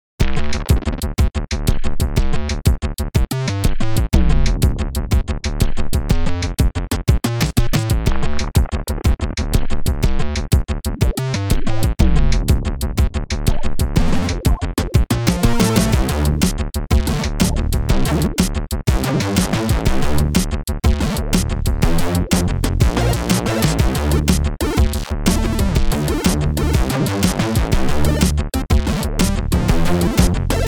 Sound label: game sountrack; Explode Arena3; Explode Arena OST